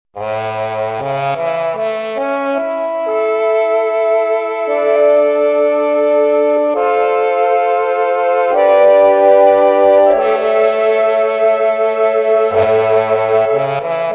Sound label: Muted Trombone